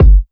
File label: normal hitnormal; osu!